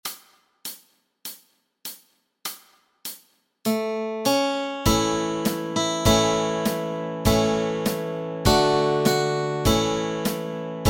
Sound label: Kumbaya melody and chords